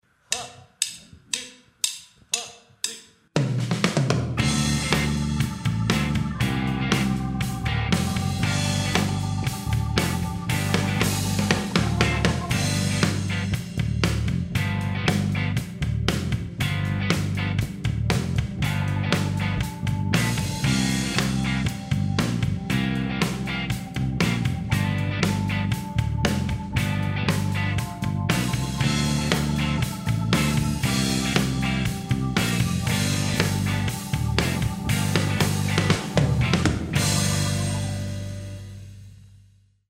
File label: blues rock G